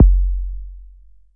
big bass drum single shot f sharp key

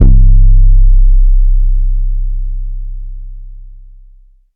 phonk kicks oneshot 808 c; kick drum

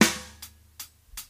big snare drum g sharp key

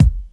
natural kick drum one shot f key; tuned drums f key

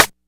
airy steel snare drum d key

